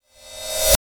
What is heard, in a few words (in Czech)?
reversni cinel